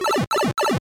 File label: Power Down; SMBDX